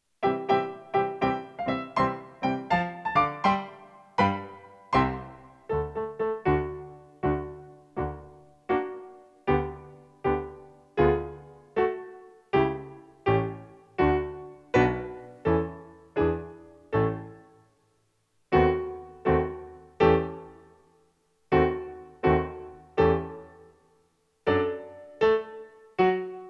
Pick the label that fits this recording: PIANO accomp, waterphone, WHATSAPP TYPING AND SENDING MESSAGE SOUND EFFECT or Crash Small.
PIANO accomp